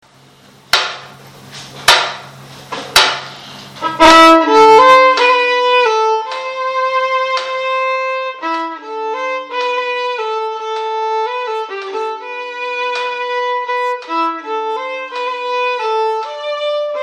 Valzer in gennaio violon

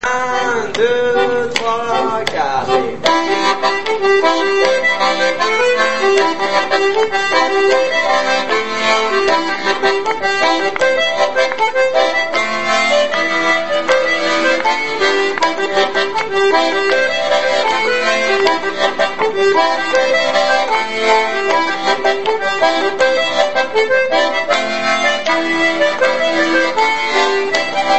(rythmique+melodie); rencontres-2013; Silver Spear

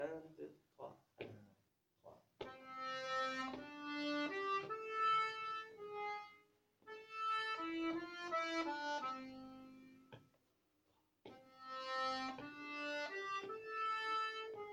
Valse 2 eme voix; La cancon

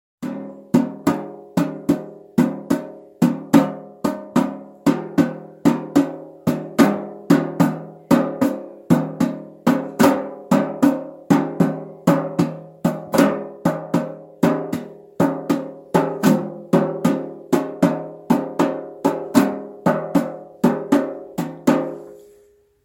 Rytme; Ateliers chant

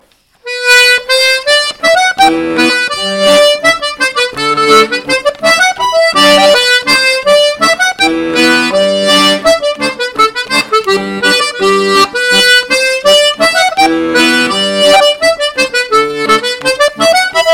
mélodie; Escotisha; Rondeau 2023

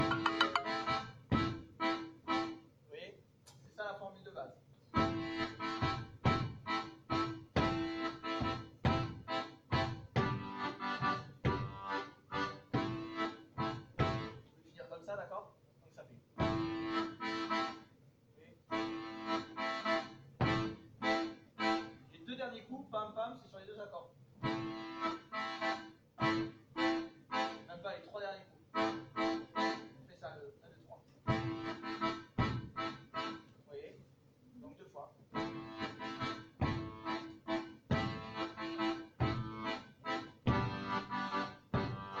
Fandango accompagnement; Accompagnement diatos

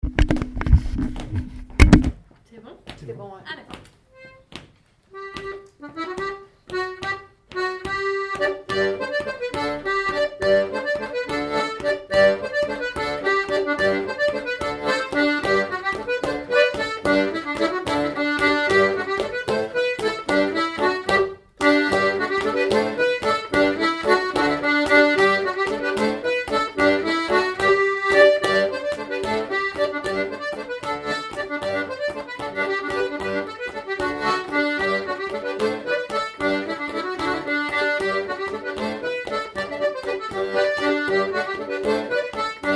Bourree; rencontres-2014; Gagnioles THEME

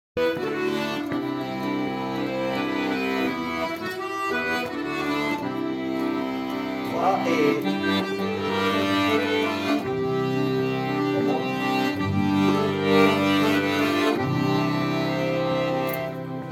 Montée modulation diato; Musique d-ensemble

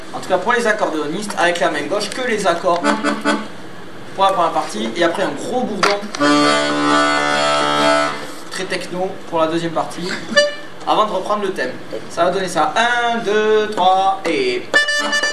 accompagnement MG sur le plan de Re diato; Avant de s-en aller

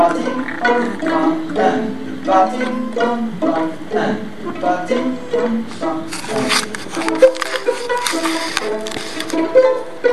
intro violon pizzicato

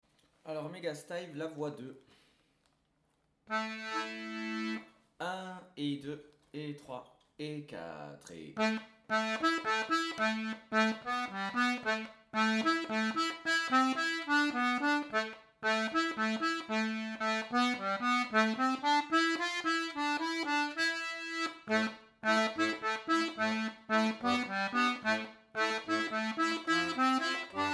voix 2, Cercle circassien; Megastive; Musique d-ensemble

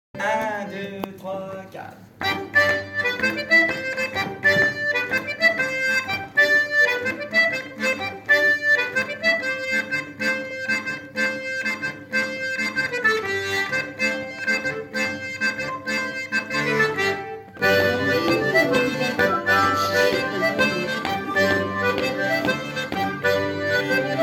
voix 2 flutes; Mieg torn 3 Lo curé

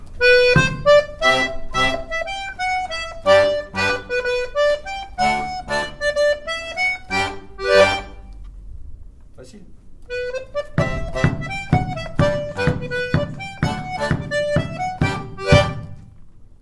Mazurka marquages accordeons; Musique d-ensemble

